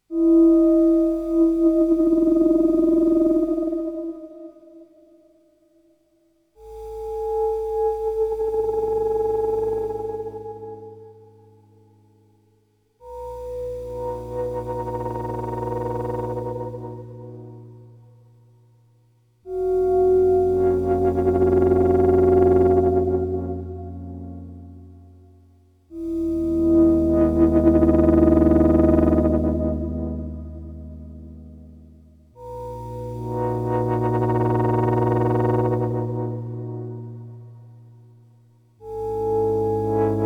Moog Sound Studio Patch Zen Chords Mother 32 Subharmonicon